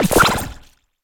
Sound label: Wall stomp; Super Mombo Quest